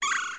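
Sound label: woodpecker, ambients; Jack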